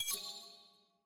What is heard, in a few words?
party toggle on click; premade voice